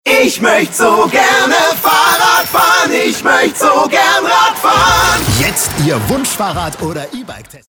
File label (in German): Werbegesang Jingle Fahrradladen